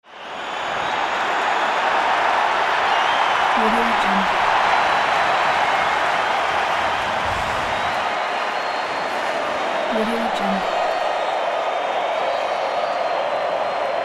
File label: stadium crowd